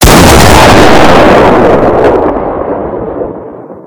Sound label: pump shotgun bassboost